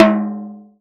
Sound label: J T 3 FFF, TOM JAZ